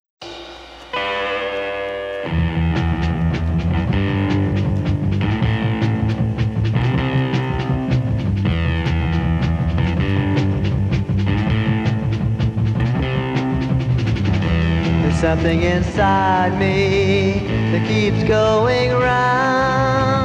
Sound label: Blues; Blues Foundation